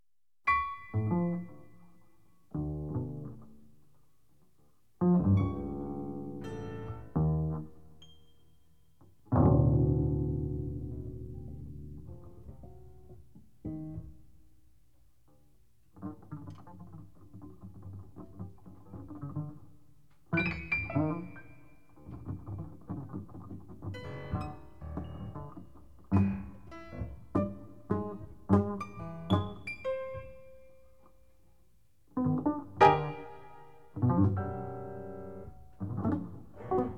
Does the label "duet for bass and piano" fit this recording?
Yes